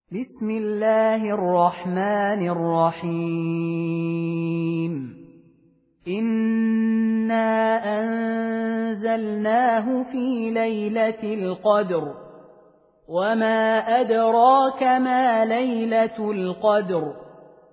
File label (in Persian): سوره قدر; خالد المهنا